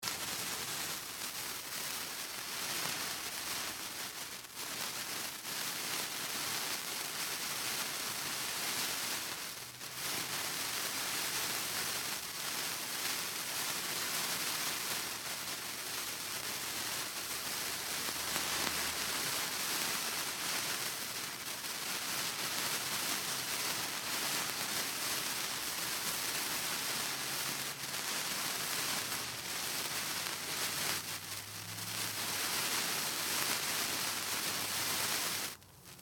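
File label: Fire Sparkler Burns Sizzle Steady Close Up; Fourth Of July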